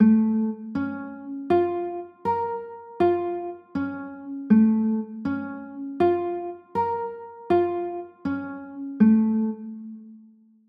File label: b flat m maj7, chords